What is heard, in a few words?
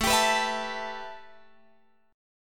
A 7th Suspended 2nd A Guitar Drop D, chord